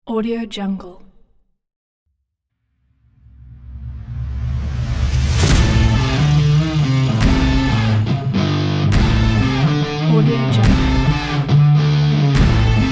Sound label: power dirty rock stomp intro